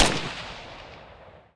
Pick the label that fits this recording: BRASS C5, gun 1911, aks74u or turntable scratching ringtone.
aks74u